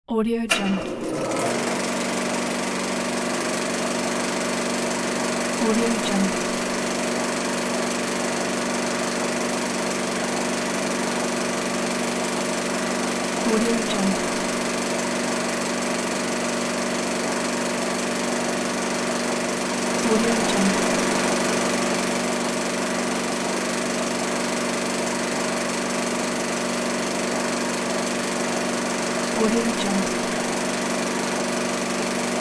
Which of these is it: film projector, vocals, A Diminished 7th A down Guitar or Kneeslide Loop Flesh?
film projector